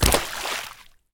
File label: swamp landing; Escape from Tarkov